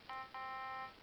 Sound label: tada